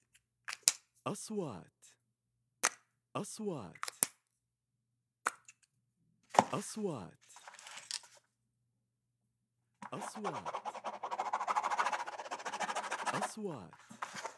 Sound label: pen open close